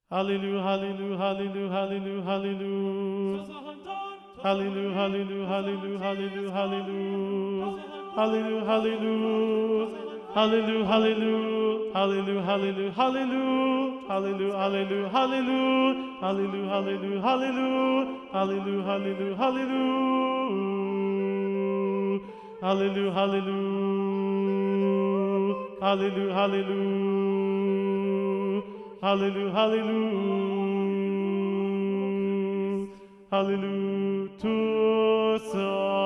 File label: Incantations SATB Tenor 1 Predominant